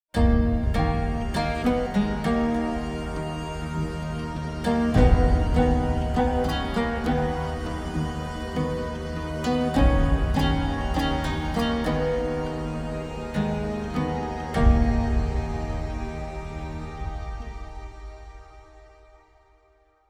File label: Sting Desert Night